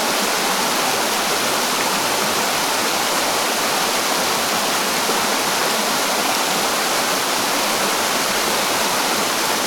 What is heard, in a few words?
stream; Level1 Ice Cliffs